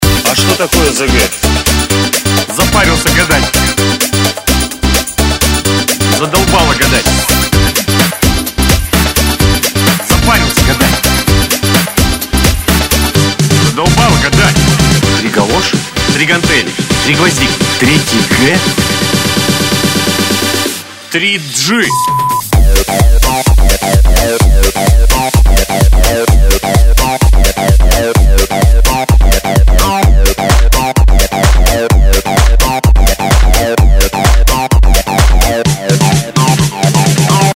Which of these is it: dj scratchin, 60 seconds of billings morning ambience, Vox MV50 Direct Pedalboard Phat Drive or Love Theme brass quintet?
dj scratchin